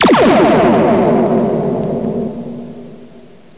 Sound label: photon, Zaps and Beeps